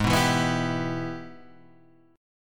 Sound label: G sharp Minor Major 7th Sharp 5th G sharp down Guitar Standard; strummed; JGuitar